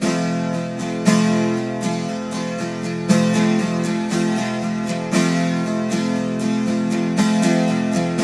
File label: 12 STR MAJ F#, TWELV; Spectrasonics; Hans Zimmer Guitars VOL-1